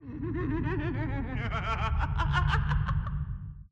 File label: Groan